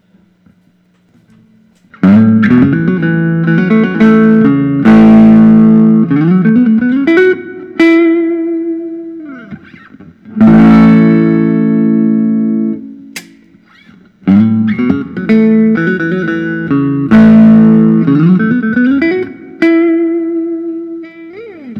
gibson kalamazoo es330 tdc block; 1962; 60s

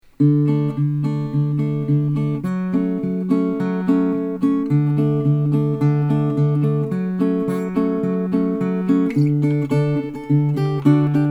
td heinonen parlor padouk

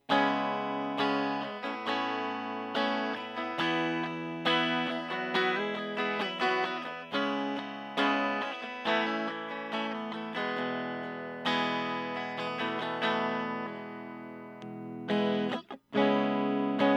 taylor custom 1 electric burled wa

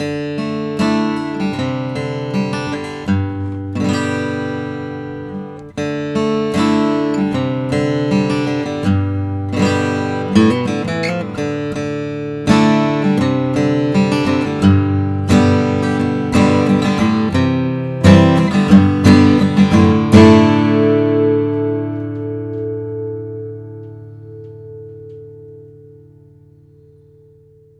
collings cj35sb short scale; sunbur

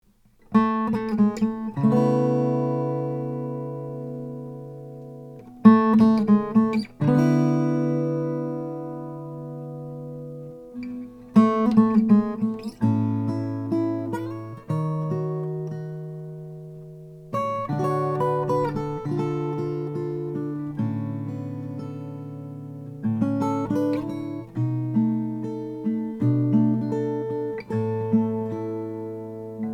greven 0 reclaimed; 1935 vintage build

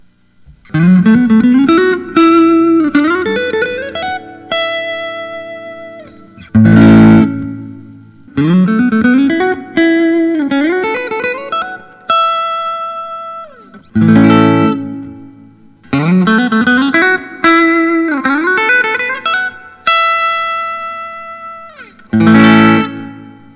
fender stratocaster; 1959

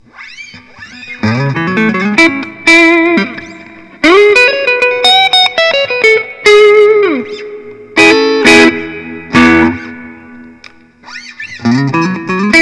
fender telecaster thinline

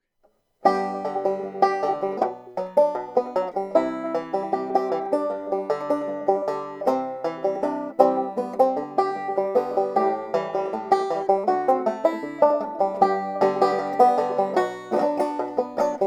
deering goodtime jumbo 6 str maple

